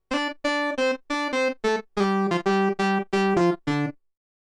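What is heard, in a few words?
Moog Lead Stereo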